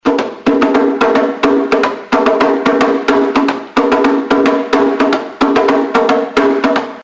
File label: Djembe